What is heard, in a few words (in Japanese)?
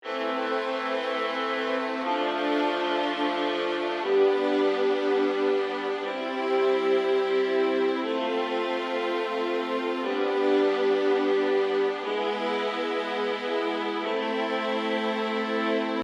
ストリングスクローズボイシング